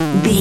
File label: retro damage hurt ouch